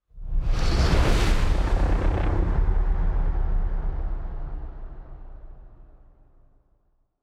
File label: ship colossus move; Stellaris; toxoids; ships